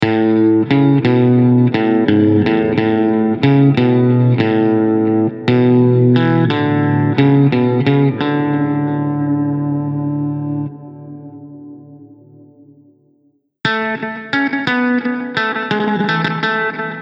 Original Oktave

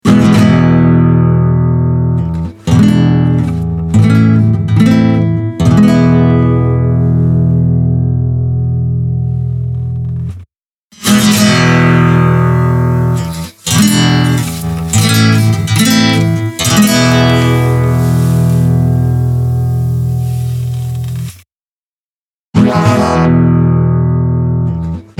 Konzert G Syn

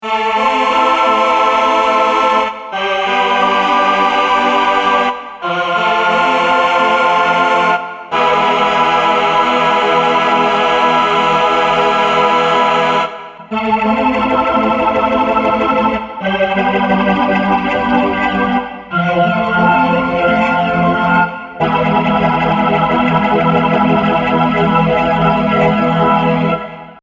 Mellotron Choir ohne mit

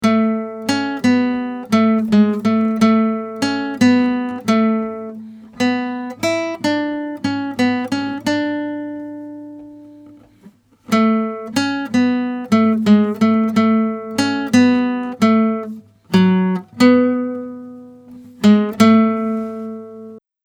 Gtr only

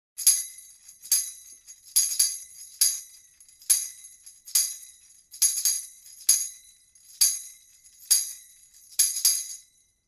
Percussion breite Niere

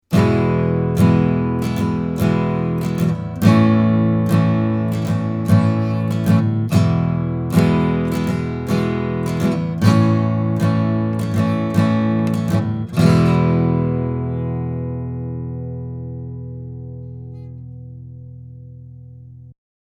Westerngitarre

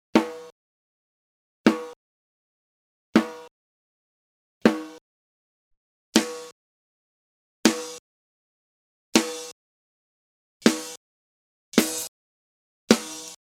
SD ohne Xtreme Metal Top

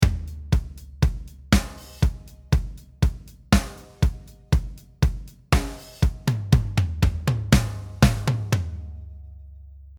Drums pur

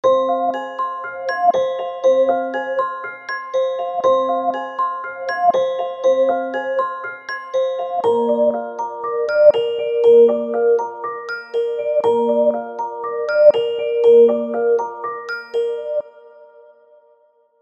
Arp 8th mit reverse

